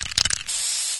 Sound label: spraypaint